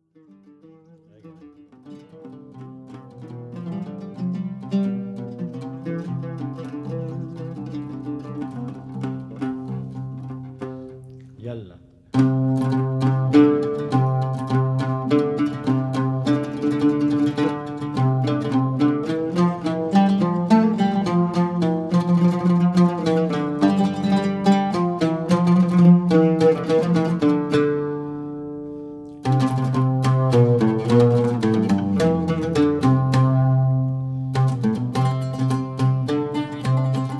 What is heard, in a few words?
maqam; maqam panjouka